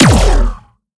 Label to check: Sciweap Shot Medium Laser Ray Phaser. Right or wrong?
Right